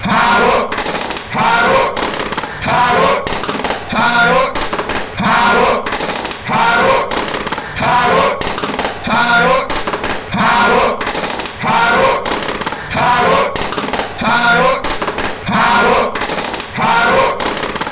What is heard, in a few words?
clap, fanchants; ha noi